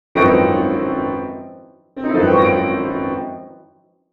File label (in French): arpege